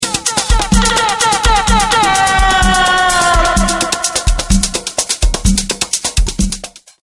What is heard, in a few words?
goal rhythm